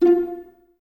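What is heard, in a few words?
Violas F 4 pizzicato, String Ensembles; Miroslav Vitous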